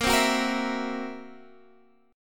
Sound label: C 7th Flat 5th Bb, chord